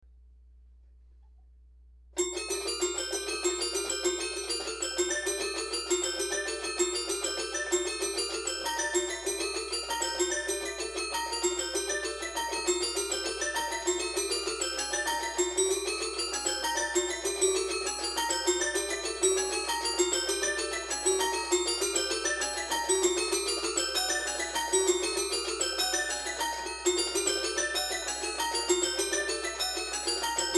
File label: Carillon, Toy Piano